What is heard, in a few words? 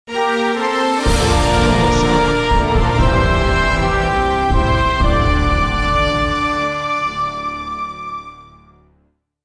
Epic Victorious and Successful Happy Ending